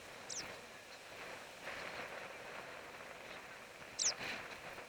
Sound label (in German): Steppenpieper Flugrufe